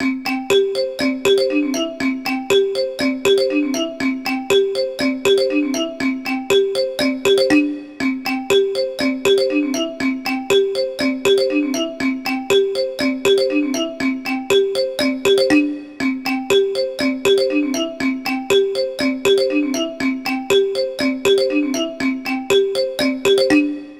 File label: marimba